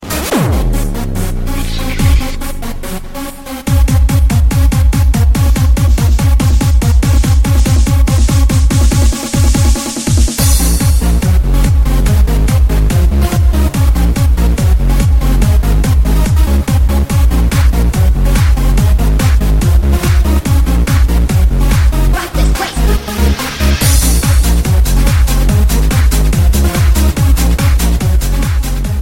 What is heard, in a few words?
Bass Energy